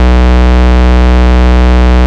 Root B, Ultimate Bass; Vengeance Samples

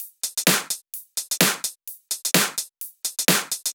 Drumloops 128BPM

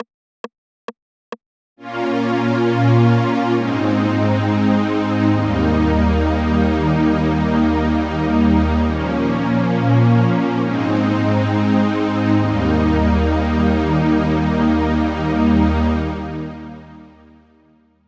String (open), 136 BPM; Vengeance Samples; Breakaway

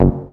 Acid Sounds F#, Synths; Vengeance Samples

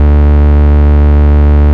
Bass D, Root D

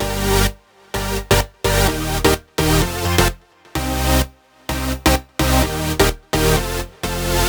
128BPM Synths; Vengeance Samples; Celebration Kit

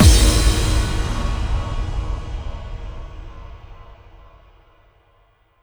bassdrum reverb FX kick; Vengeance Samples

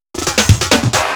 Loops Fills; Vengeance Samples

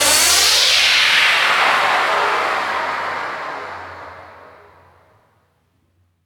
Downlifter

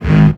Offbeat Bass G#; Vengeance Samples

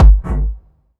Bassdrums Hard Kicks; Vengeance Samples

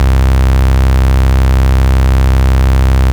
Basses C; Vengeance Samples

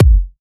Hard Kick; Vengeance Samples

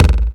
Sub Perc, Bassdrums; Vengeance Samples

